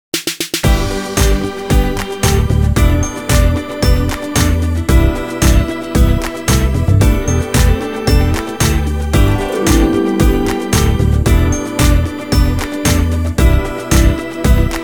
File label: INSTRUMENTAL